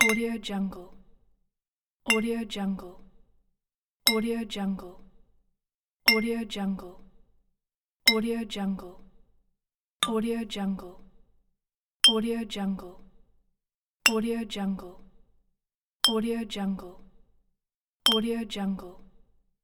glass clink; stormwaveaudio

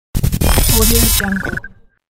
glitch

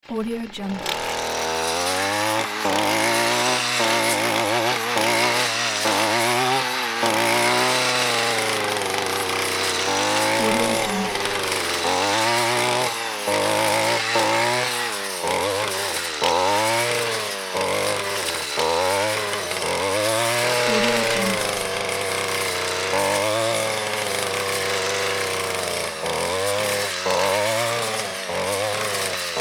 grass trimmer

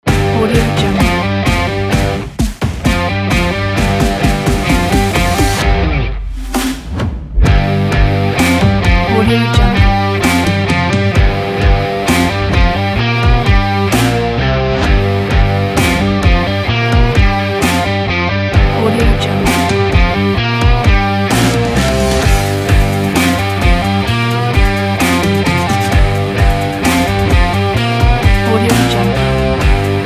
sports energy extreme advertising

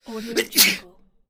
sneezing

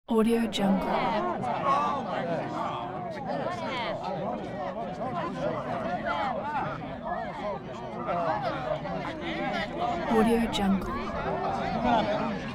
concerned crowd walla

